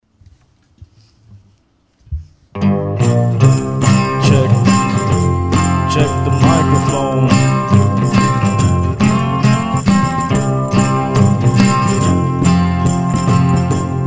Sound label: Acoustic